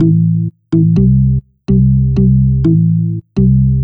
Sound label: DEEPHOUSE BASS 125 A